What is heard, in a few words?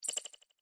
Chirp Notification